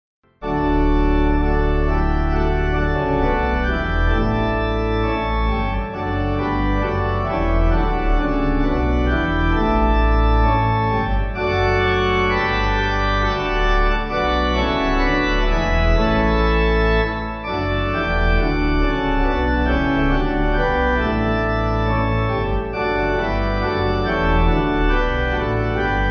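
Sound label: Pipe, pipe organ; All Creatures Of Our; Lasst Uns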